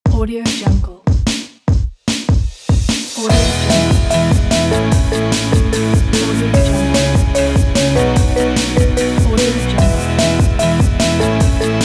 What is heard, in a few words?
happy rock intro